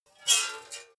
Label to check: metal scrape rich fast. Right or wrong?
Right